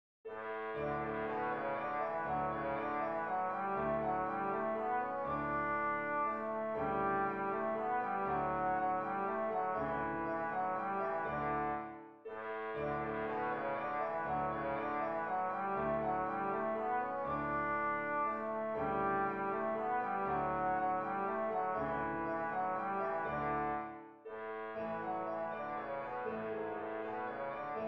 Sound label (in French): danse allemande; beethoven ludwig van